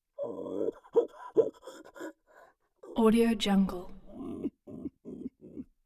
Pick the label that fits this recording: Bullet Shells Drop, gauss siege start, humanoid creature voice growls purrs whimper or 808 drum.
humanoid creature voice growls purrs whimper